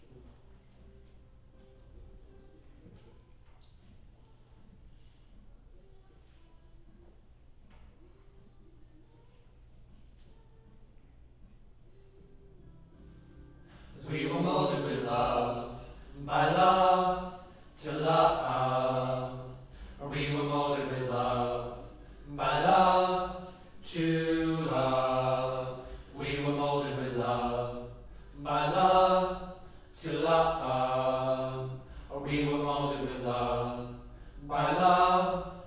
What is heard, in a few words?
Choir Harm Low